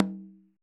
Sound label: tom, Roland MC-909